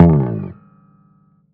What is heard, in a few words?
Bass Slide, 125bpm; Zero-G; Nu Disco; Extra Bass Slides